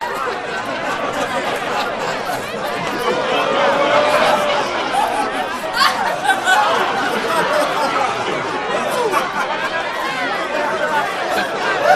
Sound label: HUMAN CROWD Festival; smartsound